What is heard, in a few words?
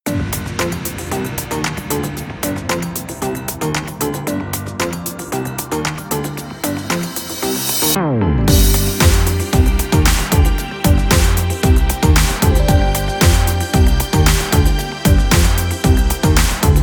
Instrumental loop; yakuza 0 b sides ps3 ps4 windows xbox one gamerip 2015